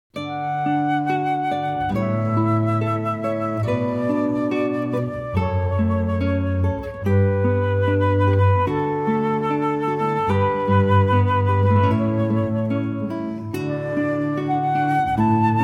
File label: Canon (Pachelbel), guitar flute bass trio